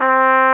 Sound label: warm trumpet C3; InVision Interactive; Lightware VOL-1; Instruments & Percussions